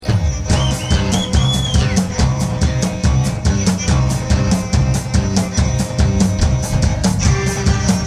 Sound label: broom dance